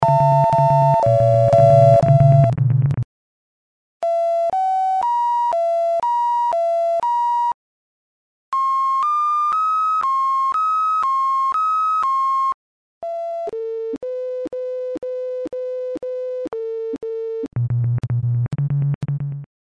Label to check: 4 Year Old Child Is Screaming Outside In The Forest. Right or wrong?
Wrong